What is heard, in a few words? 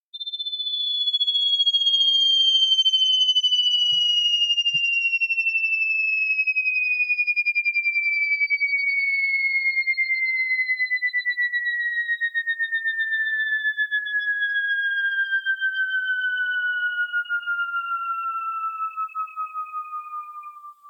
Jet Slow Down, Car Sound; Car Sound Gimmick